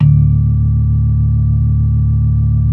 B3 D 3; Best Service; Advanced Media Trax Modern Composer; B3 LES 1

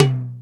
FX Sounds, Drum Machines E-MU Pro-Cussion

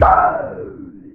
STAB

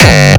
kick

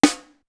Snare, Pitched Up; Clavia DDrum-AT

